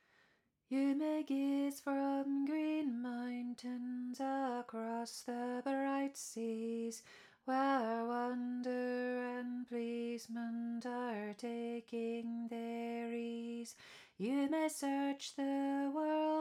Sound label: Harmony